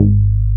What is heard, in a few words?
CROMA, Synth Bass; BA1 Synth-Basses; Sound & Vision - Gigapack I CD 2